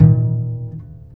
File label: TRICKS, DBASS, Jazzistic; Univers Sons